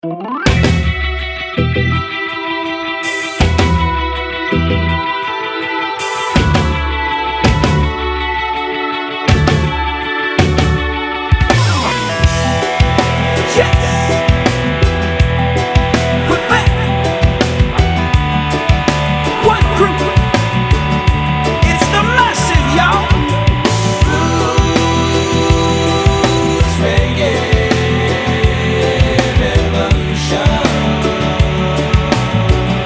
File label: One Groove